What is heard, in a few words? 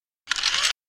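Mechanism Fast On off, servos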